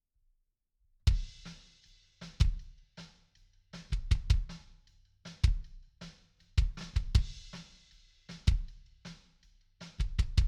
kick

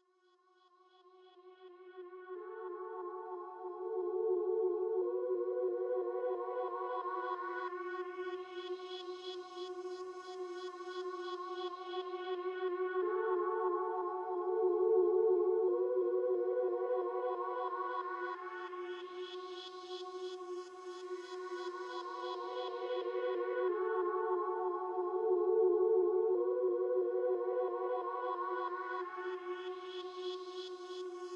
synths

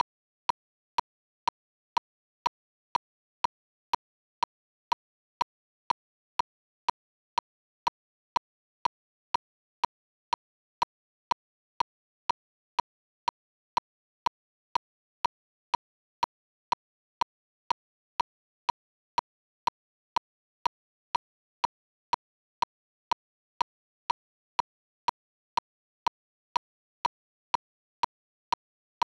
click

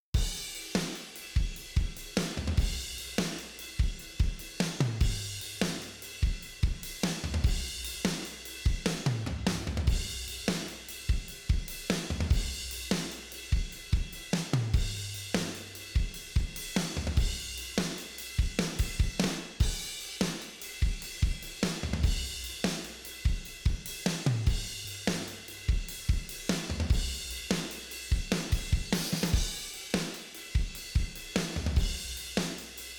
drums

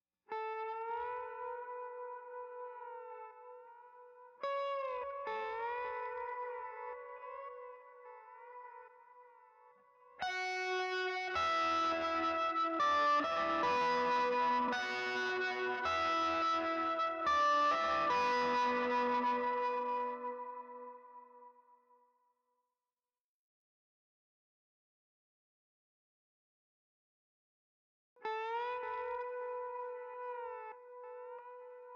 gtrs; stems

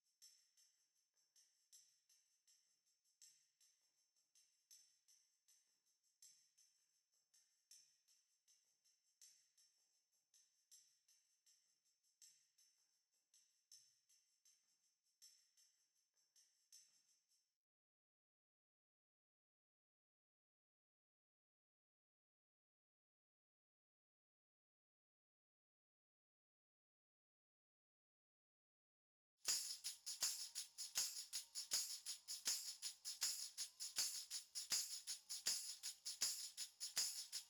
perc

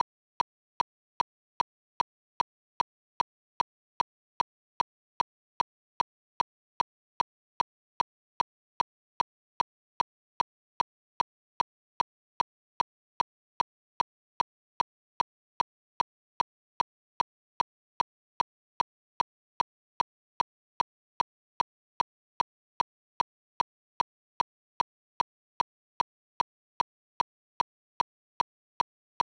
click

